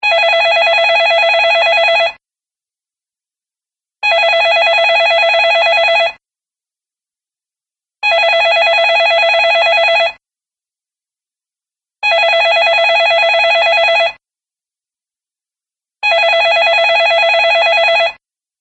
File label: New Wave Ring; Classic Rings And More